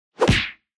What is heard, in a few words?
Hit Punch Dry